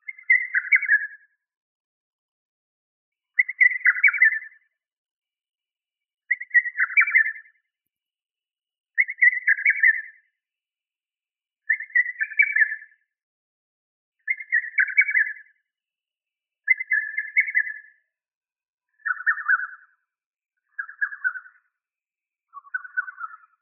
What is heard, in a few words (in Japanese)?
yoruno hototogisu